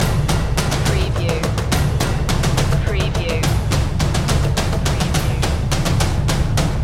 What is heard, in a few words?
megadrums loop